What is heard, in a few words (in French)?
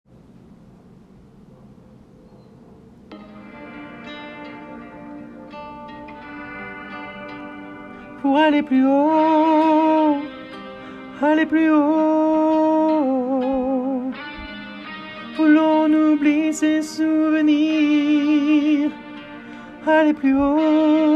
harmo basse aller plus haut